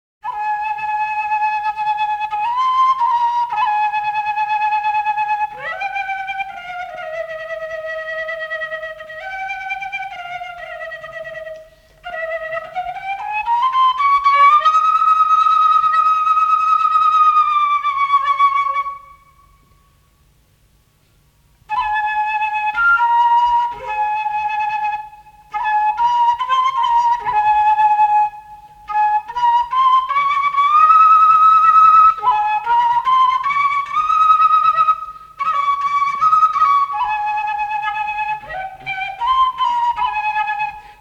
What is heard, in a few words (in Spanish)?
danza andina, les flutes indiennes d'amerique du sud